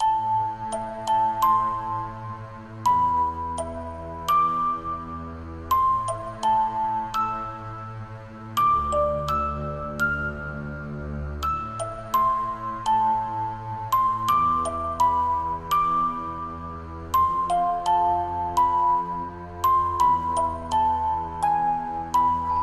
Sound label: keys goth, sad loops